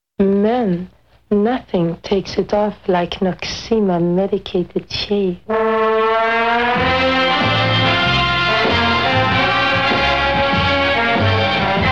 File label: Jingle